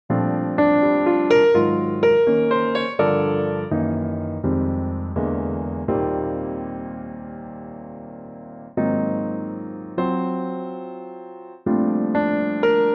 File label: Bb piano